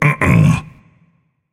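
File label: non-verbal disagree; smite; atlas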